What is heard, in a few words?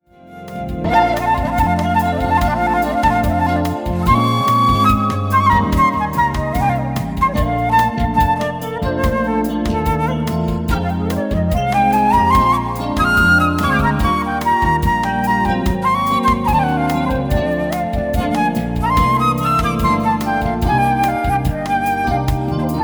sax